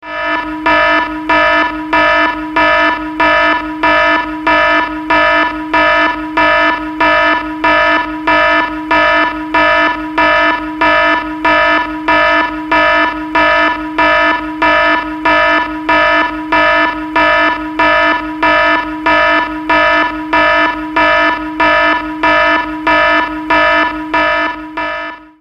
alarm tone ringtone; android

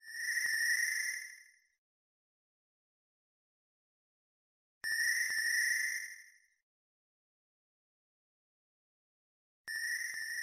crickets ringtone; android

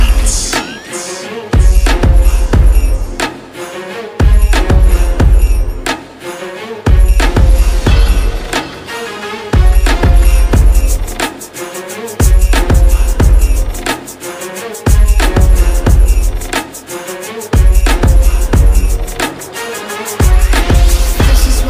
arabic instrumental arab trap beat mix ringtone

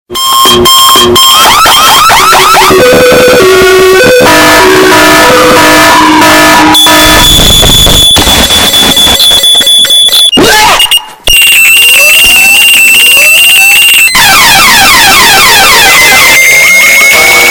loudest alarm clock ringtone; android

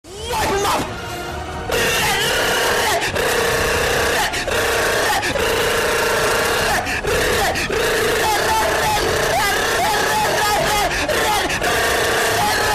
gun shots ringtone; android; tyler1

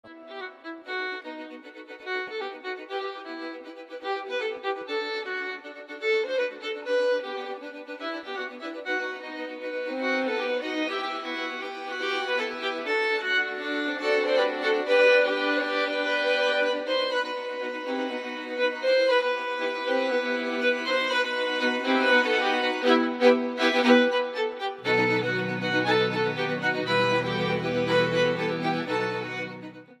violin ringtone; android

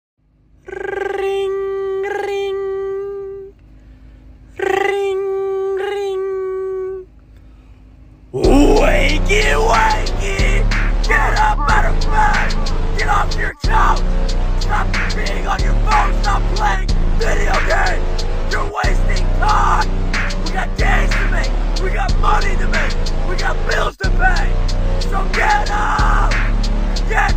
alarm motivational ringtone; android

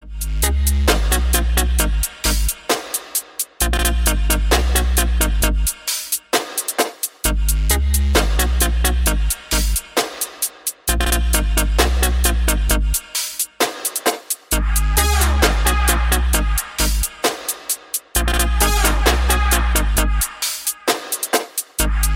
reggae ringtone

